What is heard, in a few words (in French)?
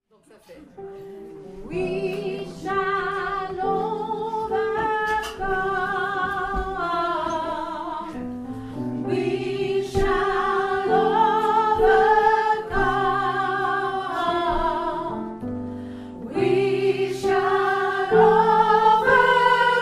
soprano; Couplets